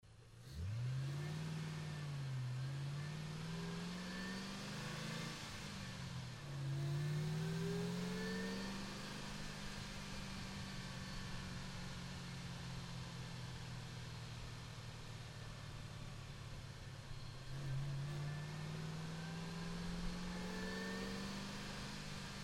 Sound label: mustang engine